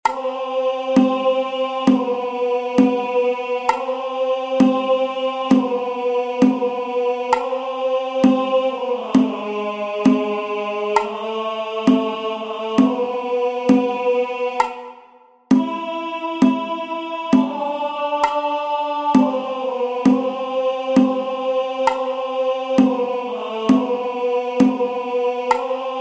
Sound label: Tenor; Jesu Dulcis Memoria